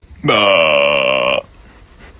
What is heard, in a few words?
seal baaaah